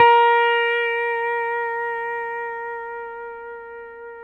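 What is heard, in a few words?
pedal steel A#4 right; PEDSTL NOTES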